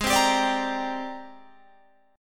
Ab Major Sharp 11th Ab, Guitar Open G; 18,17,17,19,20,20; down

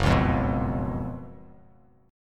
F sharp Major 7th Suspended 4th F sharp; 2,4,3,4; strummed

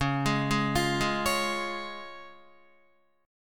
Db Major, Guitar; arpeggiated; JGuitar

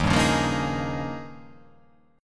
D sharp 11th

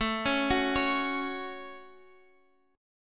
F sharp Minor, guitar

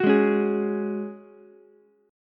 F sharp Suspended 2nd, Ukulele; strummed; JGuitar

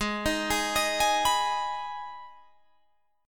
Ab Minor chord, Guitar, Drop D tuning; arpeggiated; 18,18,18,20,21,19; down

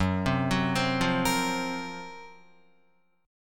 Gb Major 7th Flat 5th Guitar; GbM7b5 arpeggiated; JGuitar

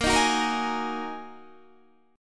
Bb 7th Suspended 4th Sharp 5th Bb Guitar, chord; strummed; 18,18,16,15,15,15; 4E,4A,5D,5G,5C,6F; Bb7sus4#5

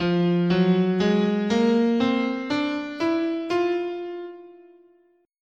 F Neapolitan Major, scale

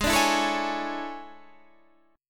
E 13th G sharp

